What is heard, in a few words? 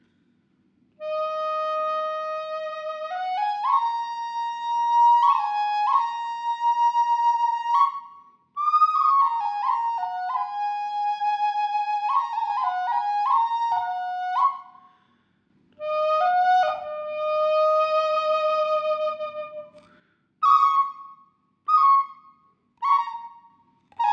lakota in key of Em, flute; drone lakota flute in key of e high mi